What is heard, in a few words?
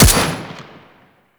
Fortress Turret Fire; Ambience; Gameplay; Warframe; Grineer